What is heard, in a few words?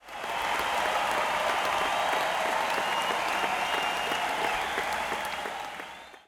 crowd cheering interior short swell stadium applause stereo; the forum